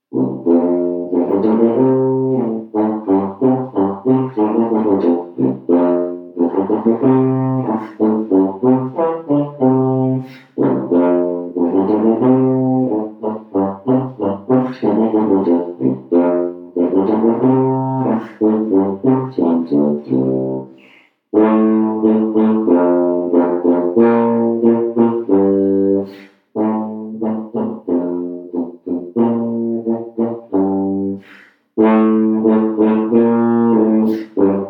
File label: Tuba; Hunters Chorus; 8th grade all state